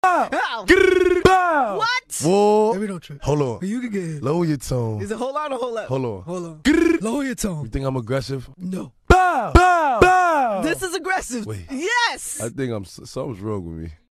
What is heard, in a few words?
adlibs